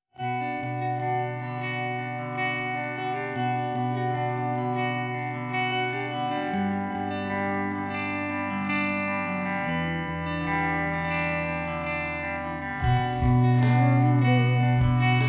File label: come harmonic